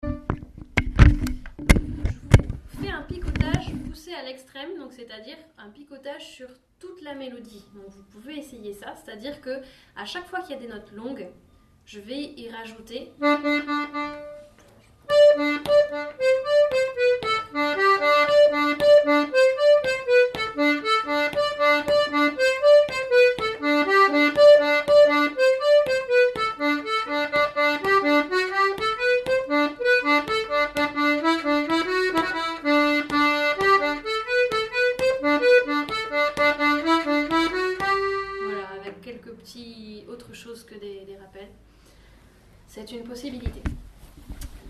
Bourree; rencontres-2014; Gagnioles PICOTAGE

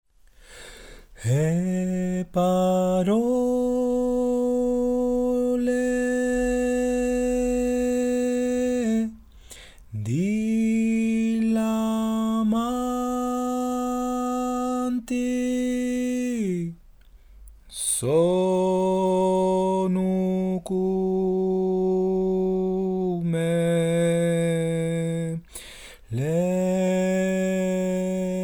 bassu; Ateliers chant; Versu dOlmi Capella

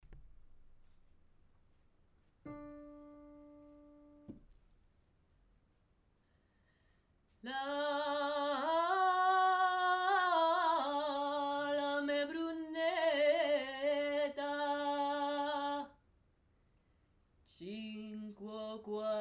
basse aiguë, chant; Ateliers chant; a me brunetta

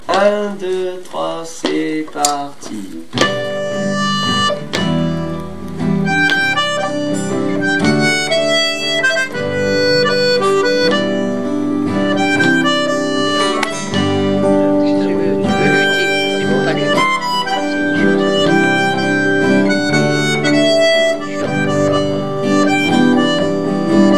Inisheer proposition impro, Mazurka irlandaise

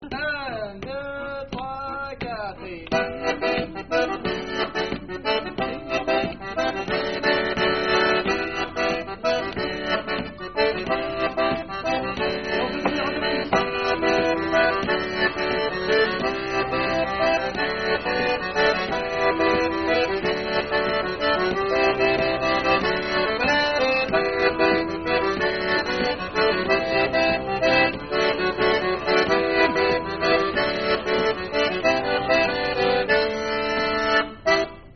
Andro accompagnement diatos 2 derniers tours

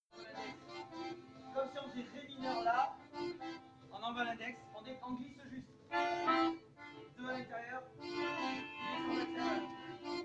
Fandango variation accompagnement

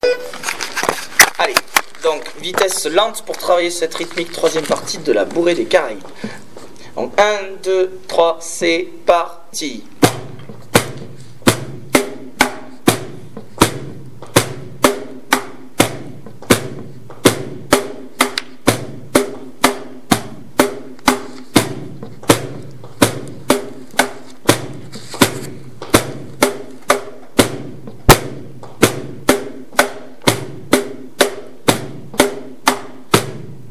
rythmique tempo lent; partie C; Musique d-ensemble

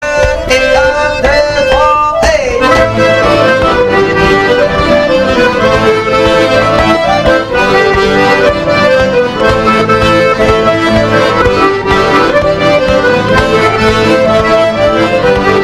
final polka; Finish set; Musique d-ensemble

